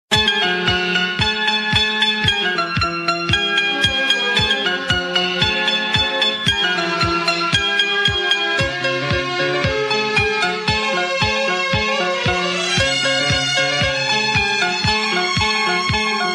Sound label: Casio